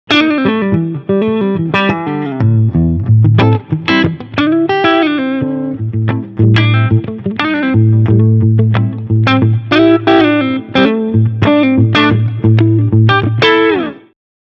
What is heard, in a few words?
Clean